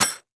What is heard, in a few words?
Sports Bag Plastic Bag Of Metal Stakes Dropping On Dirt